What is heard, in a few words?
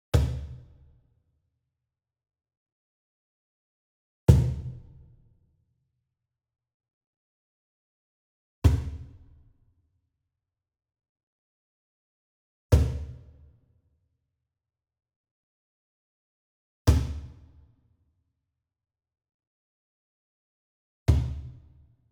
Wood Percussion Cajon Bass Slap Hit Medium; boom library; Cinematic Strikes Construction Kit